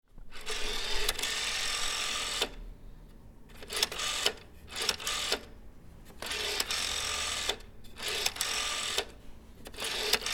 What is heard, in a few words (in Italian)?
Telefono a disco